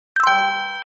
message alert; Sony Ericsson K600i

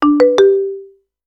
Doorbell; Tecno Boom J8

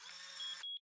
camera focus, ui; Gome; U7

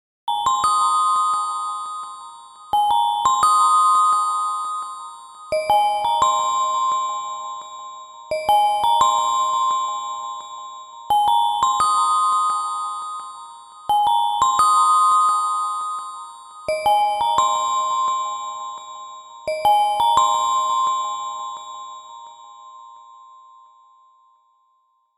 Ding, alarms; Motorola; Moto-E20; Lenovo

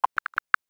Folder popup open; Samsung; GT-S8600 Wave III; EffectTone

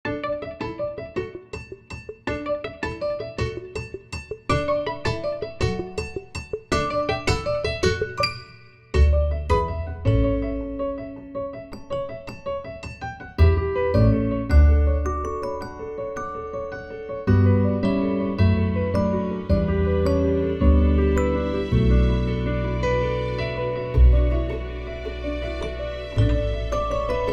Pleasant Life Is Good Alarm, ringtones; LG; VELVET 2 Pro

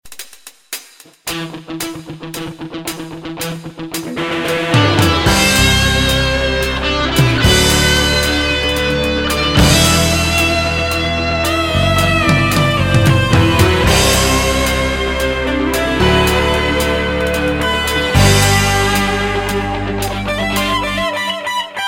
ROCK, notifications; Vertex; Impress Drive

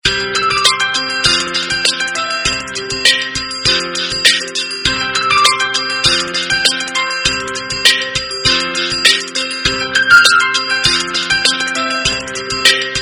Bass And Light Percussion; China Mobile; A3s

